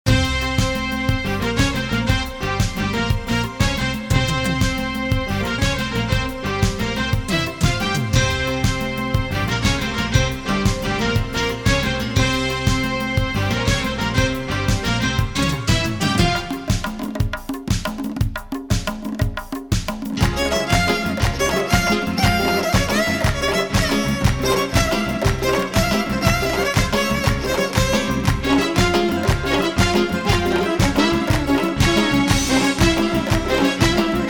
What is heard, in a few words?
Instrumental